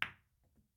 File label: hit, billiards; monkey2; PoolMod